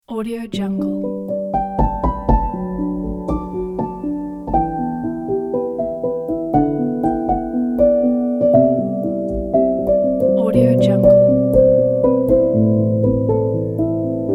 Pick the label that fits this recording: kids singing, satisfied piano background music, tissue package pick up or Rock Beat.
satisfied piano background music